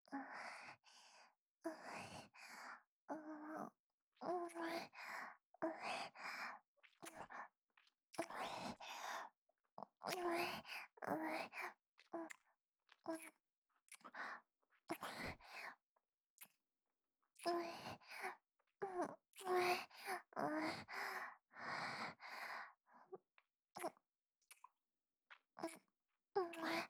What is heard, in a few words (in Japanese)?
キス; 【マゾハーレム】